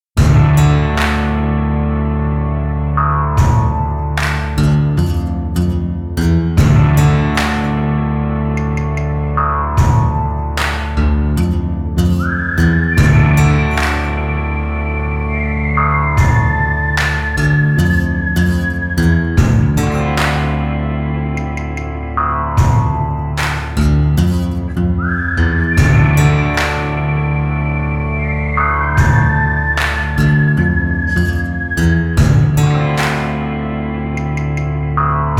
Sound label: Afternoon Standoff Western Drama